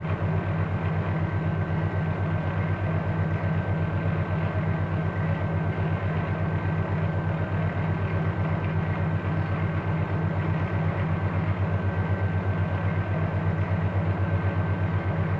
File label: submarines engines; World Of Warships